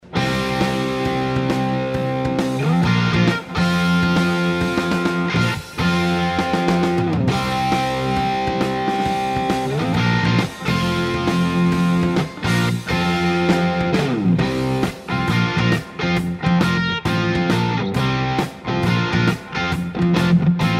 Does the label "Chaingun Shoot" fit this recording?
No